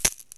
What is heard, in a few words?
PLANET PERC; Crypton; DrumBase5000